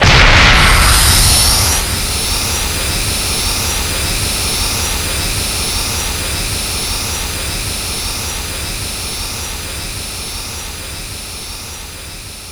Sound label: Ojama Lp; SE Ing Other Rule#02 (SE Bigbang01 Ojama Lp)